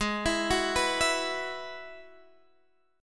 G sharp Minor 7th Sharp 5th G sharp, Guitar Drop D; x,11,14,11,12,12